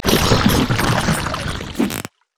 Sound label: drunken rage drink; LoL; gragas